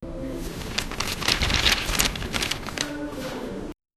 sterile hospital ambience

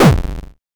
2d pixel 8bit game

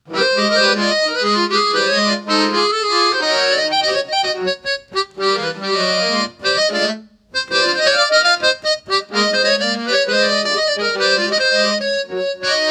comedy jazz accordion

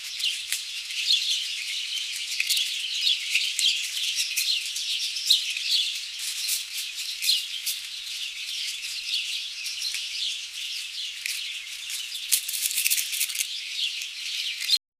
birds chirping light sound

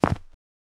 equip heavy leather bo; sfx

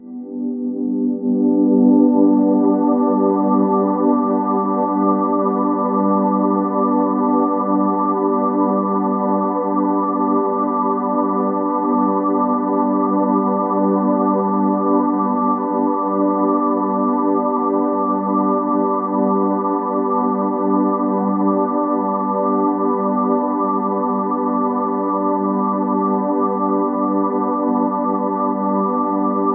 ambient pad a minor

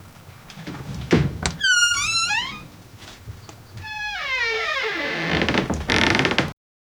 approaching footsteps the

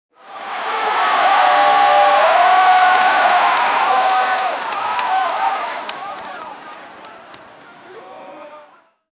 warriors crowd

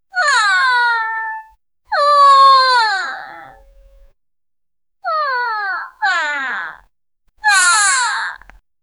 girl falling effect

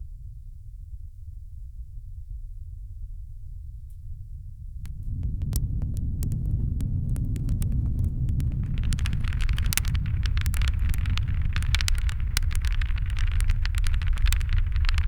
paper firing with solid, fire

